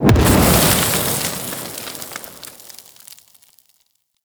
Micro Molten Explosion A; Warframe; Corpus; Arachnoid Micro Molten; Abilities

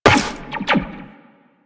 Step Zipline, Footsteps Walk; DE; Warframe; Tenno

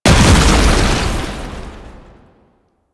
Tank Infested Charge Wall Slam; Enemies; Abilities; Warframe; Lasrian

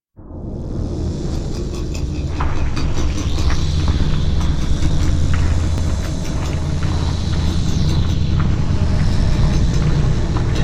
Background Machinery D; Warframe; Ambience; Grineer; Grn Boss Room 1

